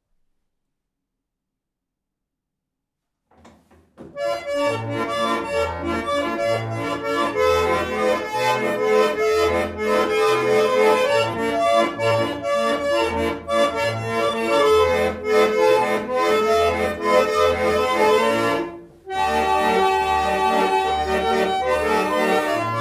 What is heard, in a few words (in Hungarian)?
kintorna hengeres 37 hang 8 dallamú németország